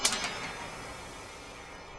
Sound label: Close